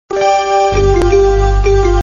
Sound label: alert; espn